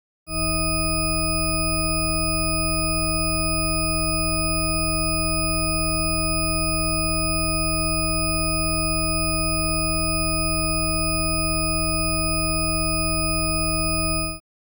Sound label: Multitone signal, octave spacing; Stereophile Test CD, Vol 3